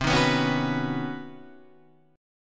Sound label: B Major Add 9th; strummed; Badd9/C; JGuitar